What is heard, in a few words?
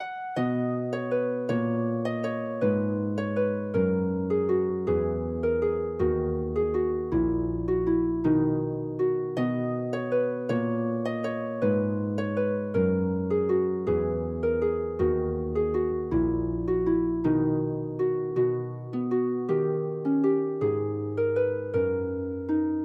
gigue in c, harp; losy jan antonin; gigue in c for harp